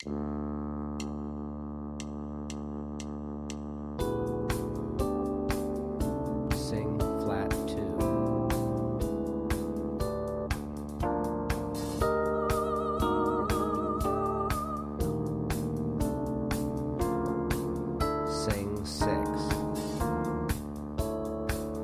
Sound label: D Blues Slash Chords No Bass Soprano; slash chord ear training